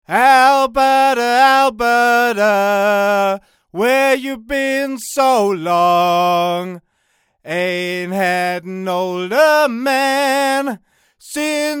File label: Miktek CV3 near